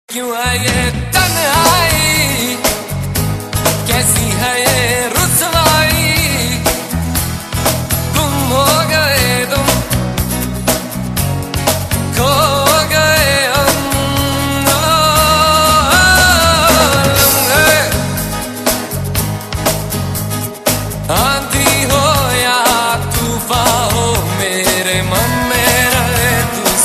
solo; blues

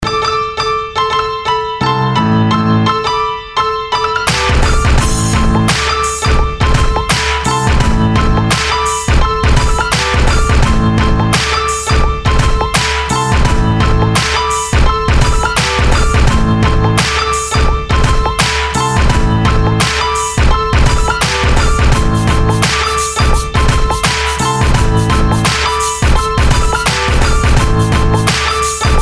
Hard Westcoast

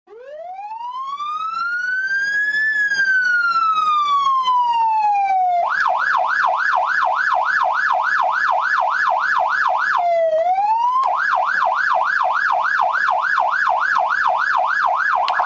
code 3 siren

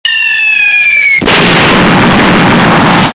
sms bomb; ringtones

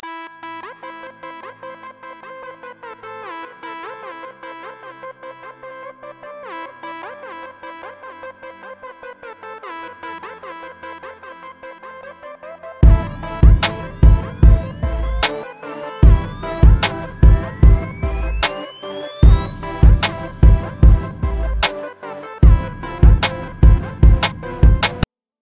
Tone

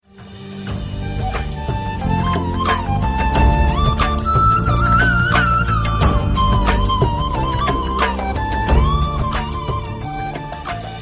classical fusion

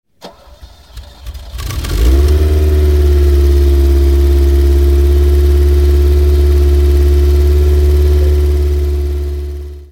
Fearrari engine

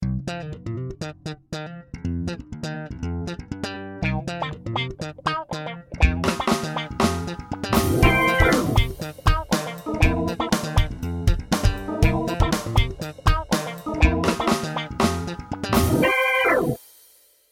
Funky

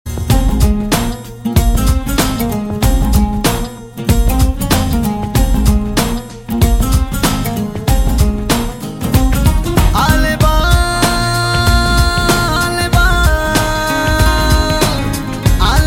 ishq telugu

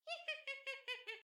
Witch

